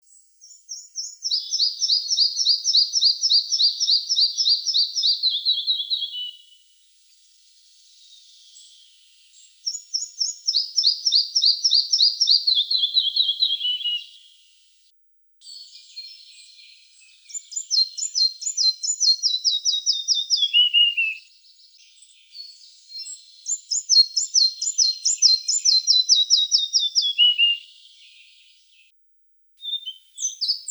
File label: Ficedula parva